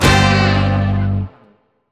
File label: Hit